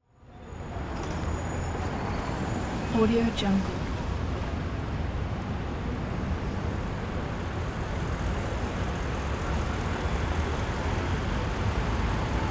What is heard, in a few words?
new york city 7th avenue